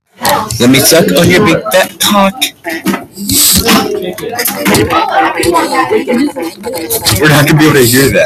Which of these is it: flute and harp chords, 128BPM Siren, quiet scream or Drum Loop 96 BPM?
quiet scream